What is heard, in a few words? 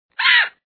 Sound Off Screen; meeblings gamerip